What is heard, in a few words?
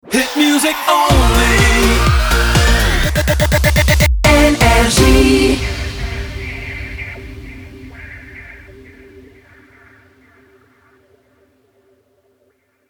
Jingle Shotgun; NRJ